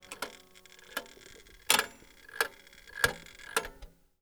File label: Old Metal Clock; 99Sounds; The Warehouse